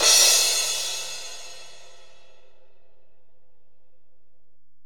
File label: Ride, Alesis DM-PRO